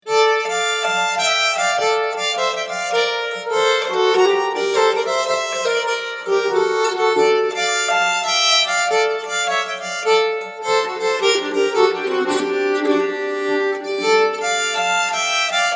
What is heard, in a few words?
polska, nyckelharpa; Niklas Roswall